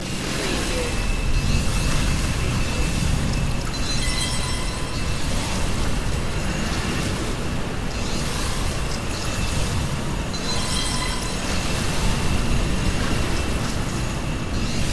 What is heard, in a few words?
starship interior ambience loop